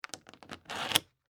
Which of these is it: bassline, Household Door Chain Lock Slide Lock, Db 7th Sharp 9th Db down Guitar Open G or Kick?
Household Door Chain Lock Slide Lock